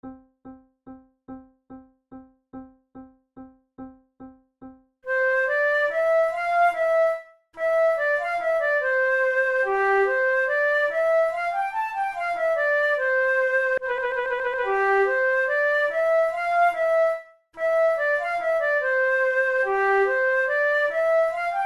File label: menuets; Naudot; 6 babioles